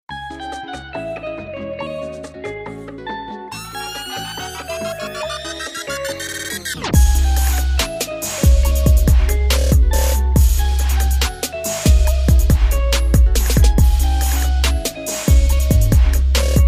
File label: trap remix ringtone; Narcos